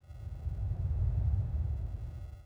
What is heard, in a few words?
deep rumble; ambience; Soldier Of Fortune II Double Helix; XBOX; kamchatka